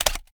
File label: reload empty magout; xmag; gmod; viper